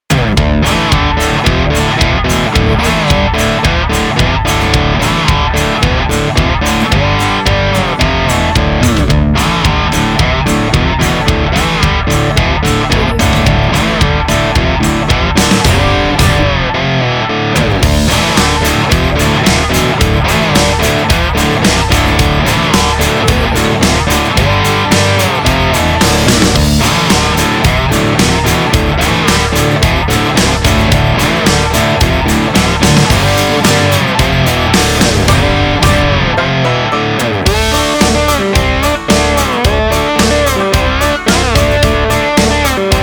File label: power dirty cowboy rock